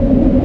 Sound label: hov tank; INCOMING